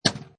Pinball Sound Chunky Clank; Video Games

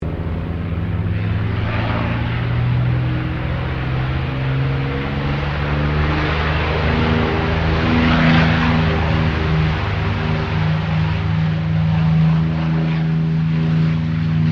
AIRCRAFT CAC CA-18 MUSTANG MK23 TAKEOFF; CA-18 /P-51 Mustang; Airplanes (Sound Effects:Vehicles)

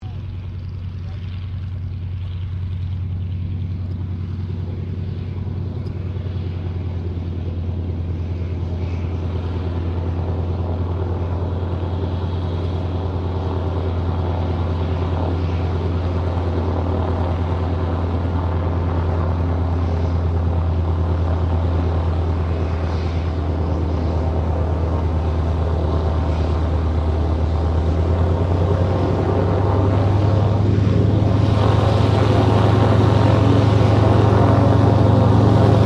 AIRCRAFT CAC WIRRAWAY TAXI STEREO; CA-16 Wirraway; Airplanes; Vehicles

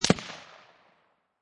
Rifle Remington 7Mm Single Shot Exterior At 75'; Rifle: Remington 7mm, Single Shot, Exterior at 75 feet

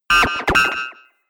Droid Disagree Bleeps, robot sound effects; Sci-Fi Robot Sfx

